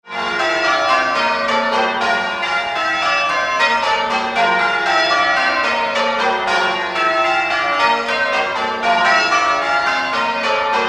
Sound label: Ixworth Grandsire Triples, bells